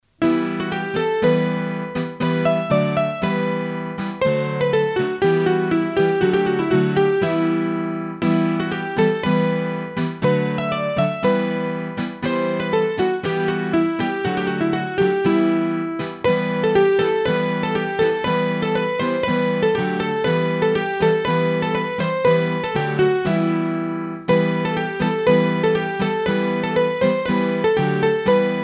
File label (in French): Bourree, hurdy gurdy; Bourree d'Aurore Sand; trad; Bourrees a 2 Temp